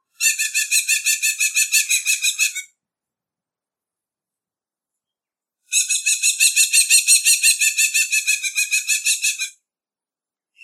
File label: female new zealand falcon karearea